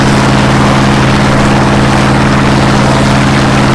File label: bearcat rear, engines; War Thunder